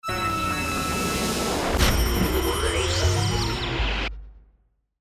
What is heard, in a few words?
Ascend Digital